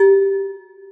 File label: celesta, programmable speaker